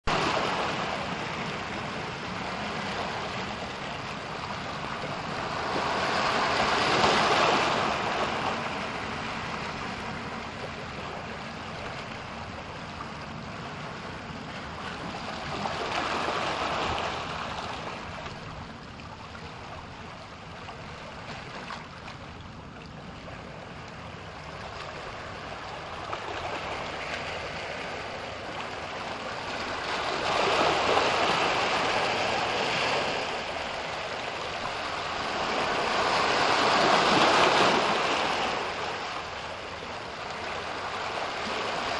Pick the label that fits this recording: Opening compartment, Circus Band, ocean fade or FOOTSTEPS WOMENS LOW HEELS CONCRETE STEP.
ocean fade